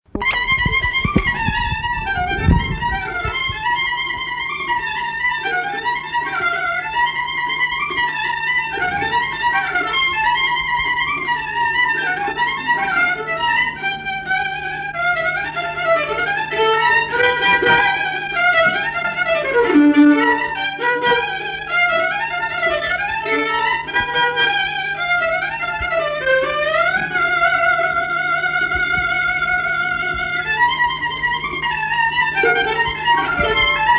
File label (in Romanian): Hora II la vioara; jocuri si cantece batranesti; Vaslui